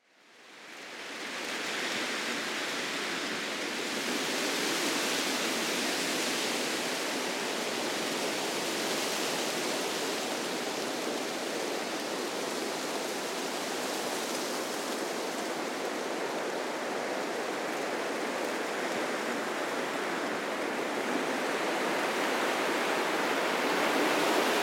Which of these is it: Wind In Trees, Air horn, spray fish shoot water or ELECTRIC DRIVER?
Wind In Trees